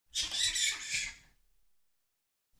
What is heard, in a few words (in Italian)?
pipistrello; Inquisitor 3